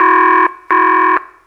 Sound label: DRMSND, Pure Electro, BEATKIT